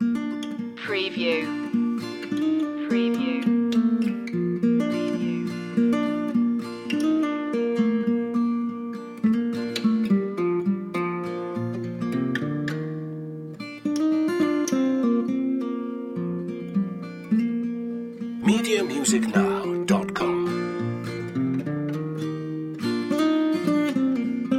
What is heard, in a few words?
barn raising loop